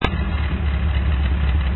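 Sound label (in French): Mgb ralenti, Mgb + Weber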